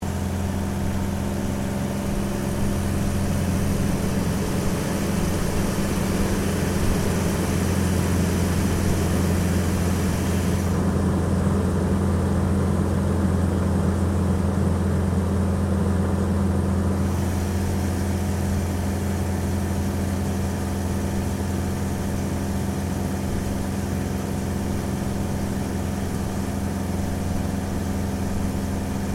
AIRCRAFT CT4A FLYING MANOUVERING INTERIOR STEREO, CT4 military training aircraft; Airplanes